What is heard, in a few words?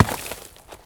gravel place; everness